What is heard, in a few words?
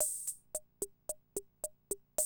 CR78, DrumLoops